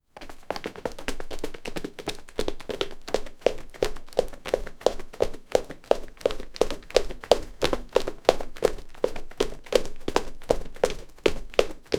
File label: human calm steps and then, footsteps